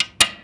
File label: latch, cabinet glasscab; sindemo2; environment